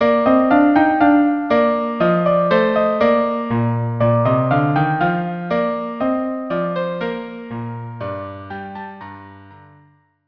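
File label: violin cello duo; Ner Li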